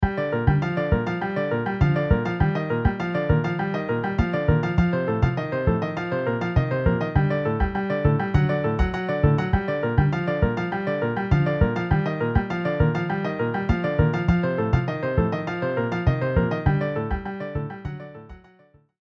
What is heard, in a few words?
Piano Bass MIDI